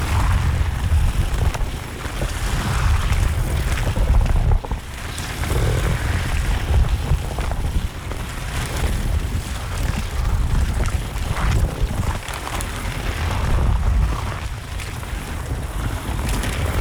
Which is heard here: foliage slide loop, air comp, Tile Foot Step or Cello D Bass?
foliage slide loop